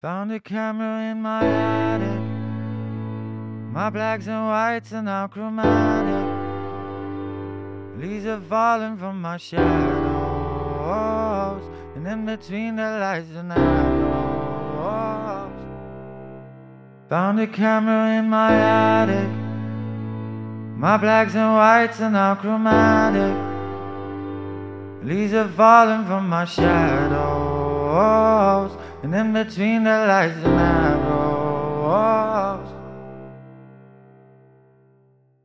Stereo Contrast For Vocals

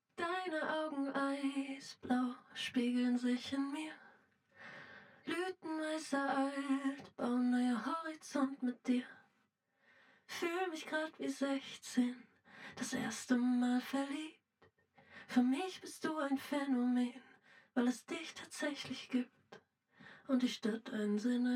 Reverb Back Wall Comp EQ

